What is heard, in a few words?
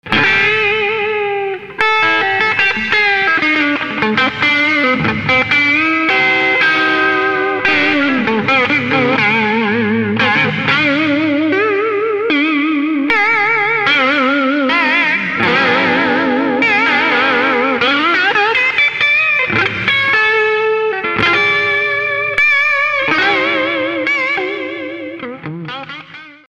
BOSS VIBRATO VB-2